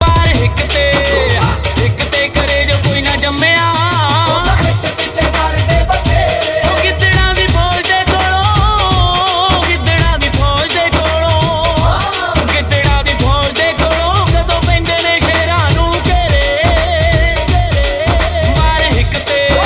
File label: Punjabi Bhangra Tones